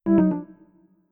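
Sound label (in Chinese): 电量不足, 音效设计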